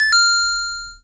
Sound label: reach ligature